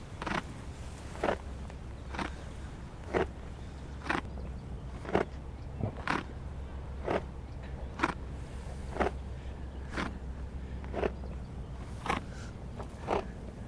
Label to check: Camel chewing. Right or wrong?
Right